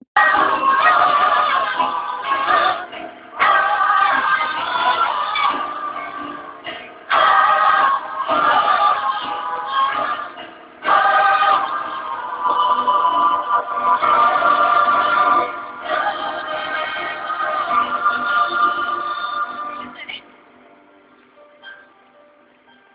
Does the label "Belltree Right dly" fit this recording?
No